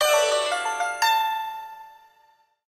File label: Exit Reached